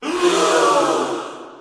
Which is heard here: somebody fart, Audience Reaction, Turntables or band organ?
Audience Reaction